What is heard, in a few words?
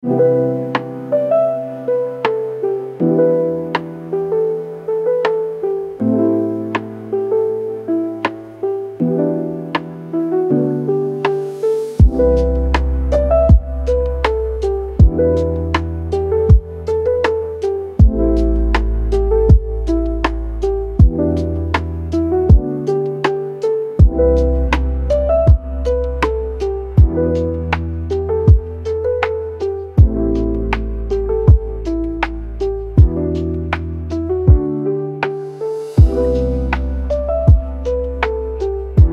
lofi beat to study, lofi soundscapes